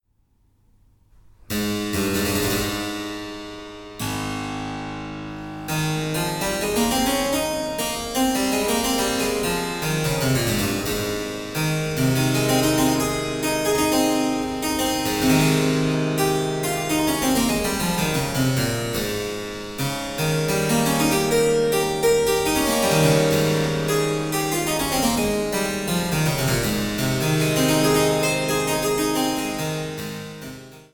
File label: Harpsichord suites Suite Praeludium; Handel; Suite 1